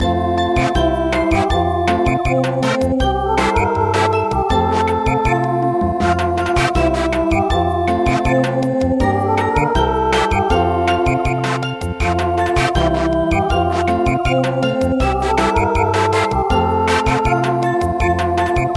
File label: Samba; traditional; Lupang Hinirang